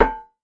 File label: metal